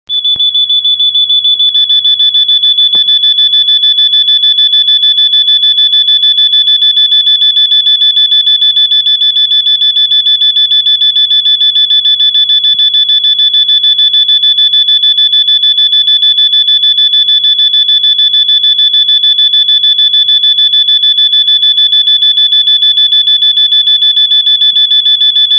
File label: EXT BUZZER max